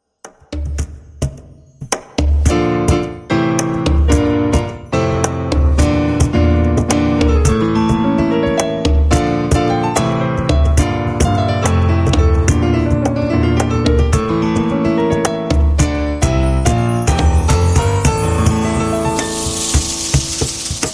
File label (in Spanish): Ritmo